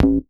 Hollow Hit Bass; Big Fish Audio; Old Skool House; OSH Bass

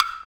Wood, Block Stick; Equipped Music; Loungin House

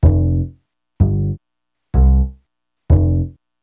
Vaguely Ethnik Bass-C, 125; Zero-G Kocktail Kollection; Construction Kitz (Lounge)

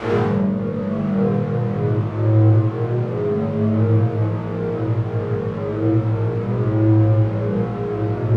SWEEP LO, Yamaha VP-1, SWEEPER; Music Store

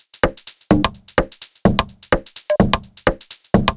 127bpm, House Drumloops; 90 s Sample CDs; Zero-G House Fabrik Drumloops; ZG Drumloops

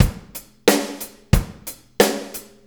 90 ROCK, DRUMS

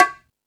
Latin Drums, E-MU Pro-Cussion; drum machine

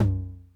Tom Low Accent Mid Tone Max Decay, 909

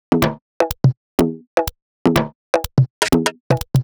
Top E 124bpm, House Drumloops; Zero-G House Fabrik Drumloops

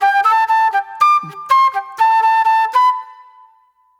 Soul Plate Flute

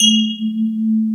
Electric Pianos; Wizoo Electric Pianos

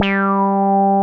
JUNO FUNK G4; Drums In Your FACE!

